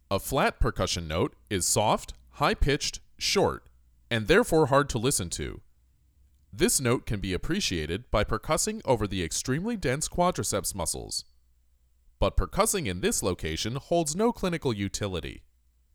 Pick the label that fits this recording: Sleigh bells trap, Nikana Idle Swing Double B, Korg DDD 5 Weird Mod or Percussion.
Percussion